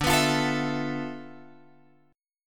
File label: D Minor 6th Add 9th Guitar Open F; Dm6add9 strummed; JGuitar